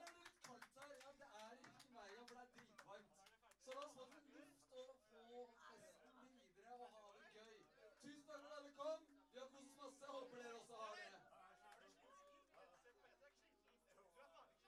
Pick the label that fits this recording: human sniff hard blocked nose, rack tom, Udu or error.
rack tom